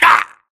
grunt death neck; Titanfall 2

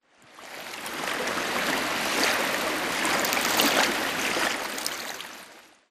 swampland water lapping; Titanfall 2

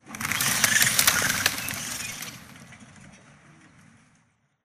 swampland bird flock; Titanfall 2

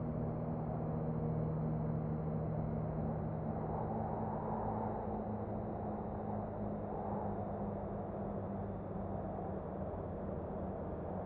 amb int small room; Titanfall 2

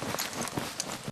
spectre chest punch idle grunt; Titanfall 2

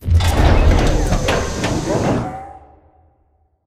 Rear Building Startup; Boomtown; Titanfall 2

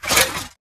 Mvmt Servo Walk; AI Stalker; Titanfall 2

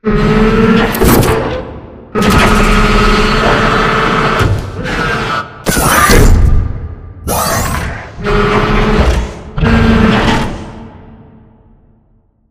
Assembly Wall Arm Close; Boomtown; Titanfall 2

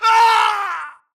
grunt death crush; Titanfall 2

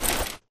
Grunt Mvmt FOL Soft Short; Titanfall 2

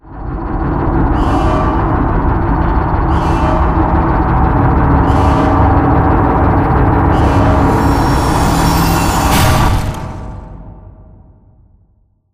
Prop Warehouse Cargo Elevator Up Move; Titanfall 2; Boomtown

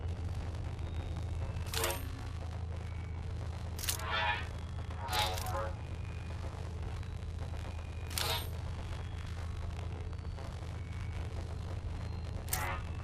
second battery; bt; Titanfall 2

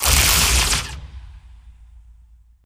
bullet energy titan arc; Titanfall 2

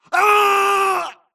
grunt electro; Titanfall 2

